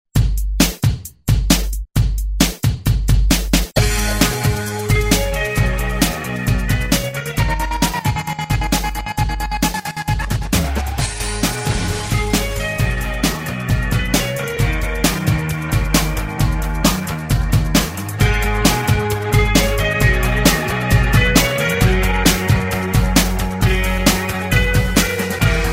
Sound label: 80s Rock ReDrum; DJ Mhark